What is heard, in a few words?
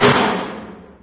handle pushbar open, doors; Garry's Mod; hl2